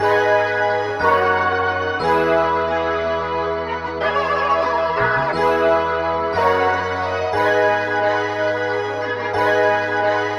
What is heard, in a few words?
keys, phonky loops